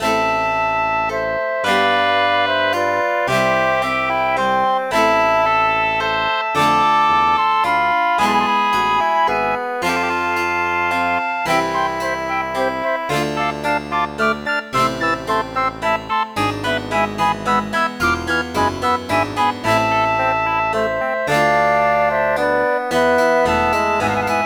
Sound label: Last Fight